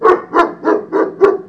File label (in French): chien, animaux nature